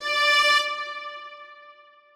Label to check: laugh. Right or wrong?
Wrong